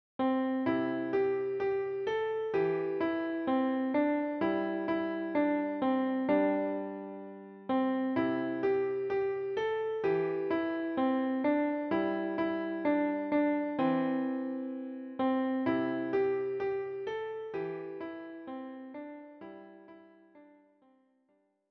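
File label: piano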